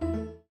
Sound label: Windows Hardware Remove; Glass